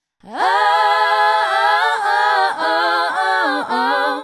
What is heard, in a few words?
Female Group, Hip-Hop and RnB Vocals